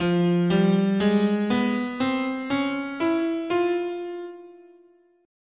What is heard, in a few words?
F Hungarian Gypsy, scale